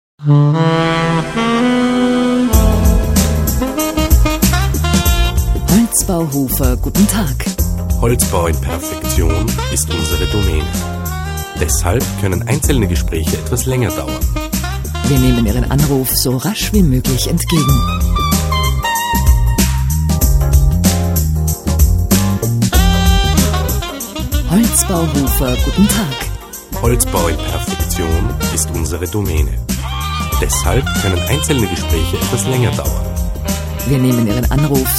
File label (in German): WARTESCHLEIFE